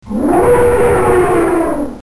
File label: elephant